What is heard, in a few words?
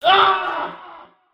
scream